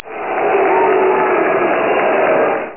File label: BOOS; SimCity2000™ Interactive Demo